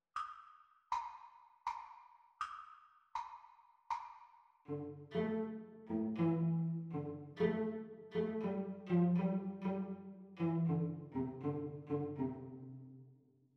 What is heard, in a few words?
Cello Duet Playalong Practice Speed; The Teacher part